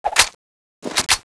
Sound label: shoulder reload, dual uzi; tfa cso